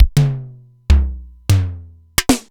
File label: Simmons SDS-9 SDS9 Factory; Sliced